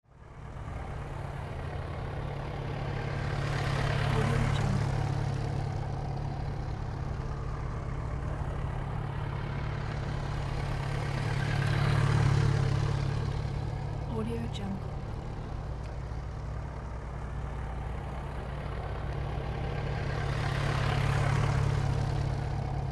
motorcycle figure eight slow laps

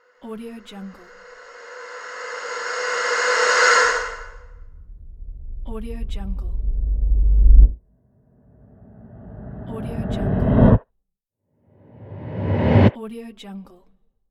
rising

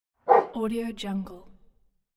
dog bark

